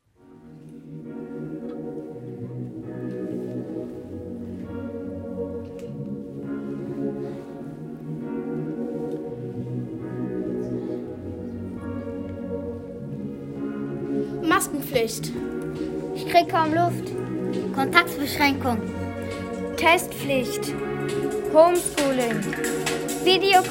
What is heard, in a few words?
Corona Rap